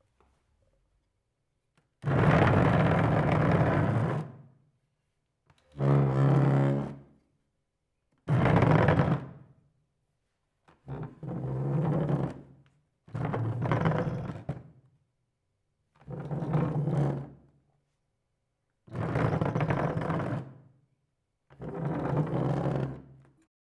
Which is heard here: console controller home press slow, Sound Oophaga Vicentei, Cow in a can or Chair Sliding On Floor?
Chair Sliding On Floor